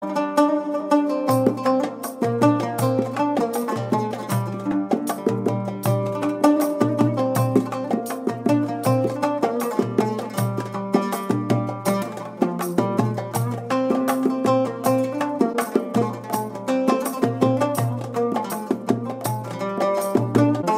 Saudi Ringtone

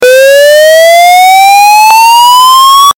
Alarm

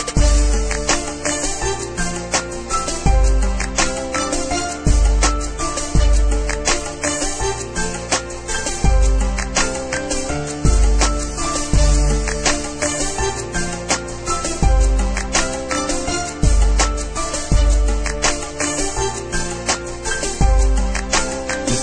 Hip Hop Beat

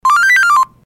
Chimes high

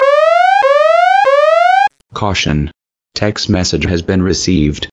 Message Tone; Avast

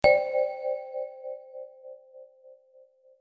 Wood

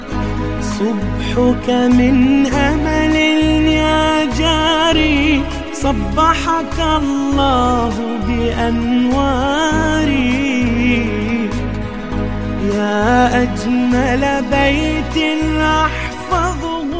Shia Music; Akraf

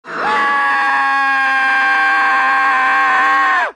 Screams; Homer

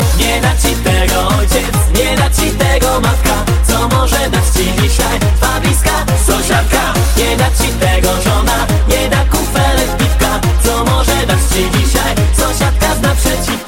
Disco Polo

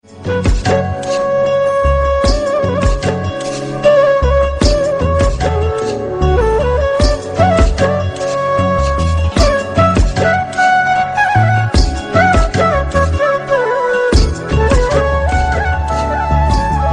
Calm Flute

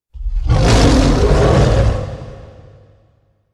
Short Roar; Godzilla

